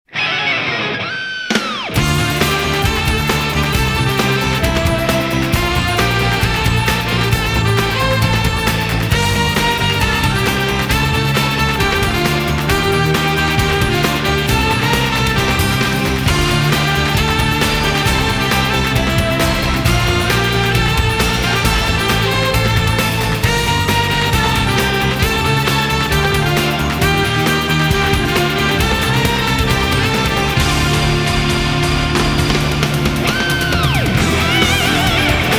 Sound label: Instrumental Version; Gundam Wing